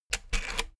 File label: rotary control plastic rattle turn